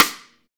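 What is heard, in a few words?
Snare Drum Rim; Best Service Gigapack 2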